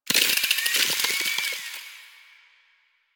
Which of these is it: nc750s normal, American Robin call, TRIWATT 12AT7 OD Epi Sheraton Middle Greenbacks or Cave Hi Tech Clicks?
Cave Hi Tech Clicks